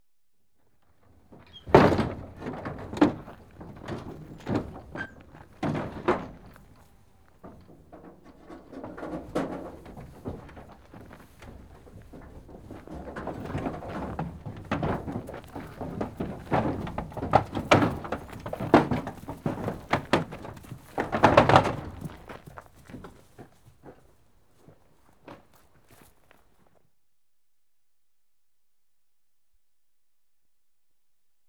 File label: Calves Out Of Trailer - Exterior; Livestock; BBC Sound Effects Library